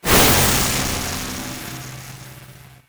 Magic Spells Cast Short Electricity General; David Dumais Audio; Electricity Magic 1

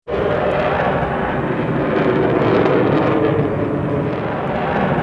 Bullet Time; FXHome; Bullet and Gun

